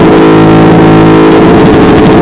stingray off high

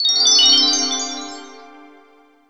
Heal Super

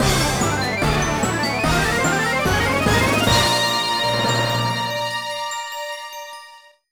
Jingle Win; Tottoko Hamtaro Nazo Nazo Q Kumo no Ue no Hatena Jou; NDS

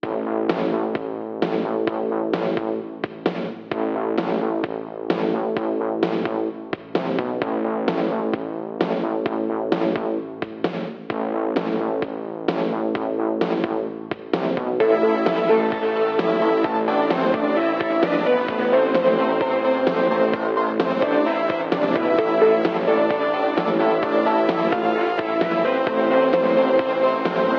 radio; Superstatic; radio sonicspeeds

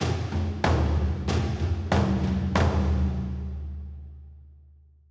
Percussion Outtro Victory; Goblins Stems; song of iron

